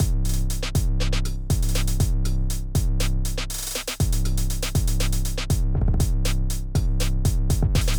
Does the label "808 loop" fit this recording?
Yes